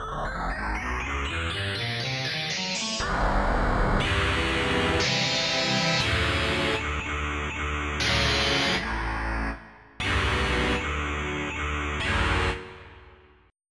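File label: Feedback Fade In, fuzz guitars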